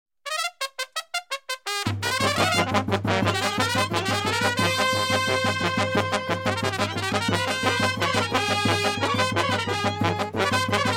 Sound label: Fanfare